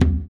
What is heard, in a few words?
surdo; ILIO; Ethno Techno